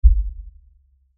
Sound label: LFE Hit, Lfe Hit Low Bass Impact; Blastwave